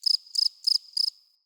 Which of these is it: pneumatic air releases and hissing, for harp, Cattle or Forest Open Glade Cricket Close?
Forest Open Glade Cricket Close